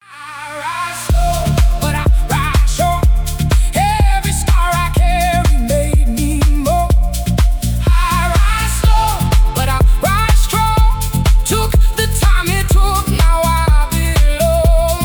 rise slow

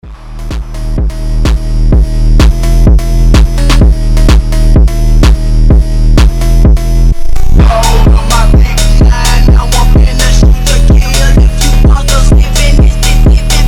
phonk

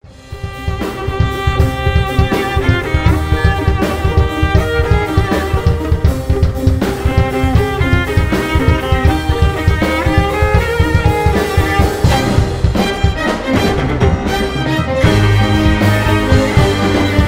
symphony instrumental

